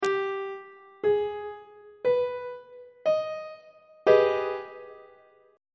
a flat minor major 7th 3rd inversion on treble clef